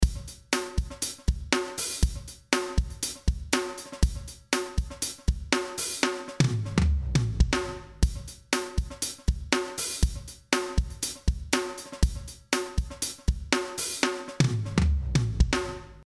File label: 120 drum sequence